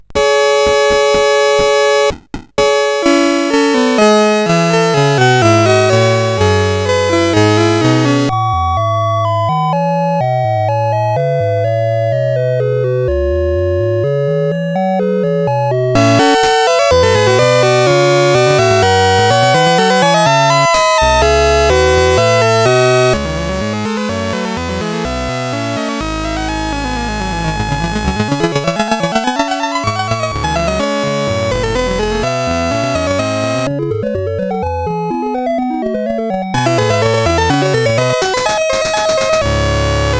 sid CSG8580R5; Arcana

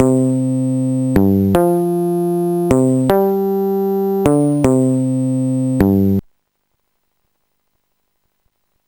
bass; Sonix Music Driver

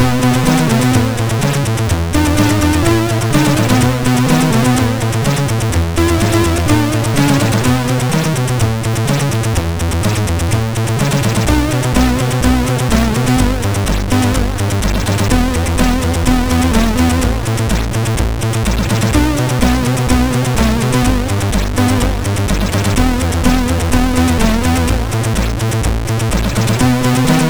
sid MOS6581R4; D I S C the Game

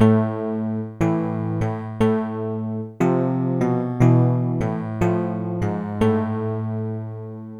you died; The Music Studio; MIDI

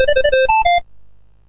sid MOS6581R2; Popeye

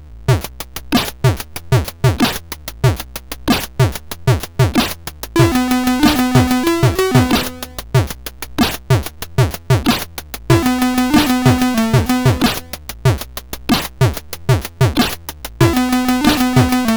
First Shower tune,sid CSG8580R5

